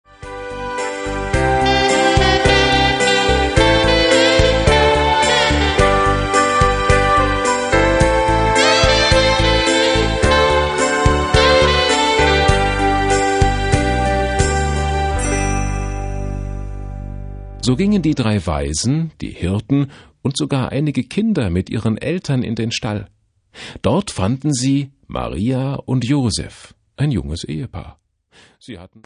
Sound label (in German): Zwischenmusik